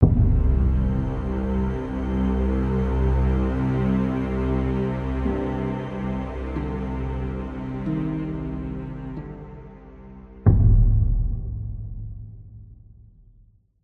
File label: Sting Serious